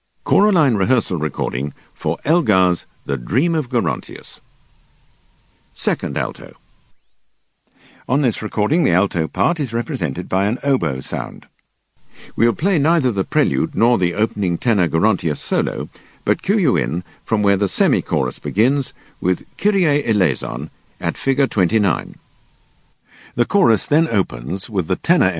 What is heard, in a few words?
Alto; Elgar Dream